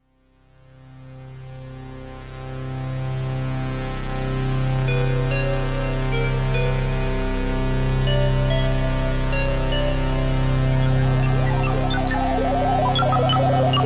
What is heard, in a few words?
Shepard, shepard tone; experimental